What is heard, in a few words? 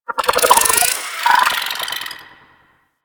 Infested Weakpoint Vocal Chatter; Warframe